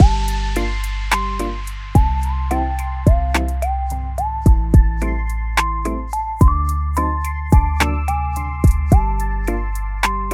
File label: Lofi Music Loop; Kawaii Love Lofi Music Pack 2